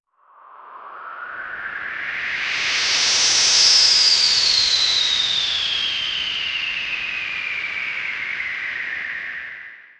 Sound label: superhero flying sky wind